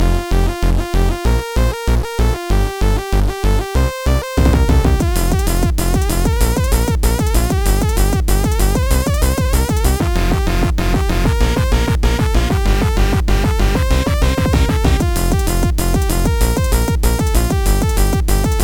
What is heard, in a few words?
trance disco fast; Trackers; Modland